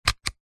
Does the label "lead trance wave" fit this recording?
No